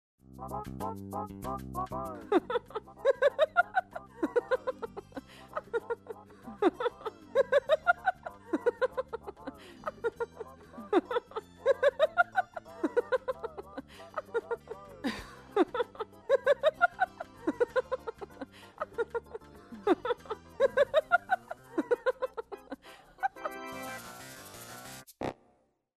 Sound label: Laughter